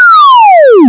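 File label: PacMan dies; Pacman English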